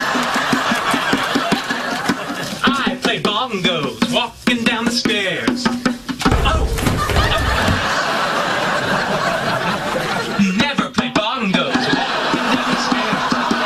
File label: bongos stairs